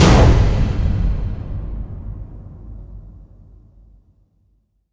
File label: Fail